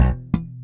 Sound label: slap